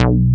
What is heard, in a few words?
PRO1